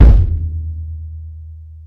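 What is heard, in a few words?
bass drum hit, roots reggae; Sounds Good - Roots Reggae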